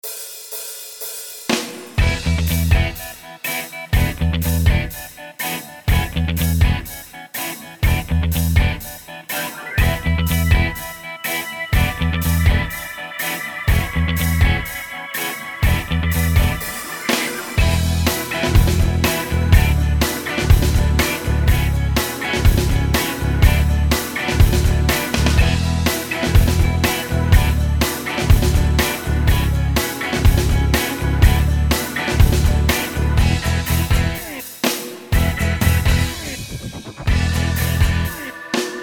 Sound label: SINGBACK, BULGARIAN